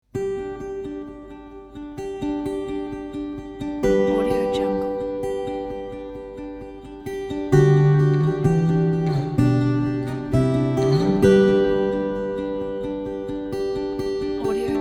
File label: ambient acoustic guitar; victormusic